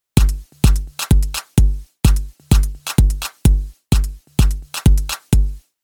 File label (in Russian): лууп